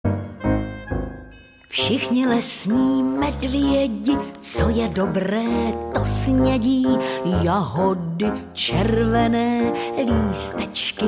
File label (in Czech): pisnicka